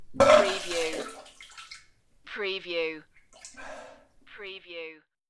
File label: Vomiting